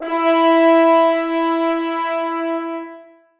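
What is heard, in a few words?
horns sustain e4; Sonatina Symphonic Orchestra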